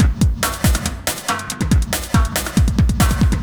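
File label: industrial 140 kit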